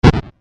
8 Bit Style Damage; Video Game Sound Effects

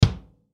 Drum Hit Bass Kick; Cymbals; Blastwave

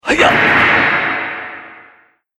Karate Impact Smash, punch and hit

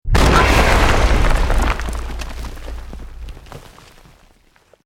EXPLOSION MEDIUM STONE ROCK

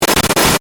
Static Burst Stutter, Static, Burst, Stutter; Production Element, Imaging Element; Accent, Transition; Static Sound Effects; BlastWave

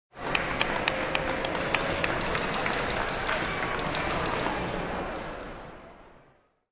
Shrt Aplse, Short Crowd Applause.; Applause Sound Effects; Human

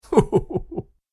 Ho Ho Ho Laughing; Laughter Sound Effects

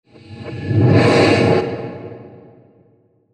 Breathy Horror Transition; Scary Soundscape; Crafted Signals

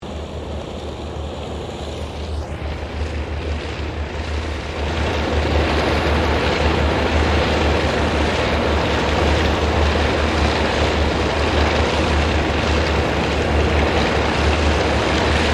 AIRCRAFT UNKNOWN PROPELLAR SQUADRAN TAXI; Various unidentified aircraft; Airplanes; Vehicles

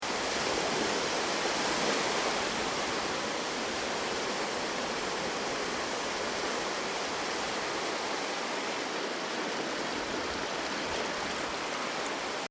Ocean Beach Sound Of The Sea Surging Billows; Nature; Crafted Signals

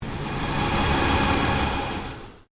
PULSE LONG SWEEP

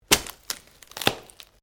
Branches Dead Snap

